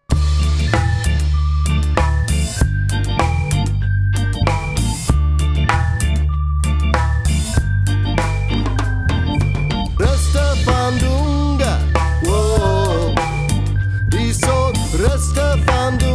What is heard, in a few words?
reggae